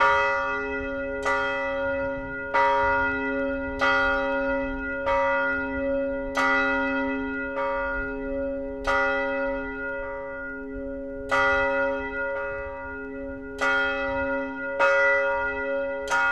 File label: Church Bells Exterior; Sony Pictures Sound Effects Series Volumes 1-10; Sony Creative Software